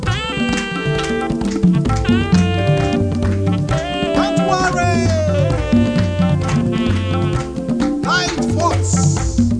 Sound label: RHYTH, LOOPS